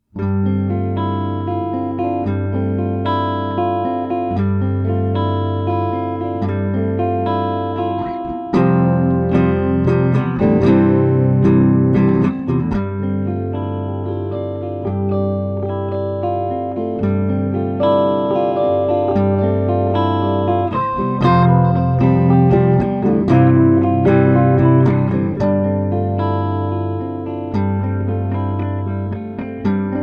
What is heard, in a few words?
Snow riffs